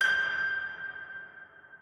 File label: piano